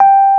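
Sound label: EP G4 FF, electric piano; Greytsounds VOL-1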